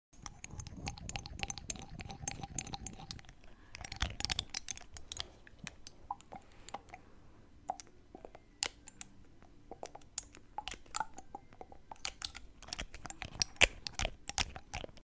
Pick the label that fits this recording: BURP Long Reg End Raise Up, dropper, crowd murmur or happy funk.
dropper